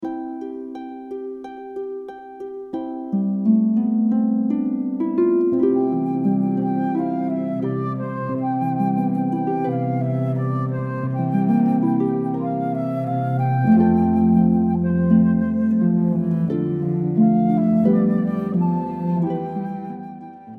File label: harp flute cello